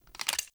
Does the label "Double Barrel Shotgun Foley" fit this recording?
Yes